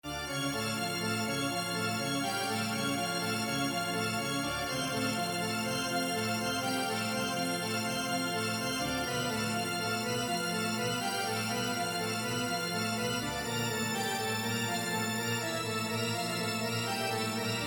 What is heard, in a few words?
church organ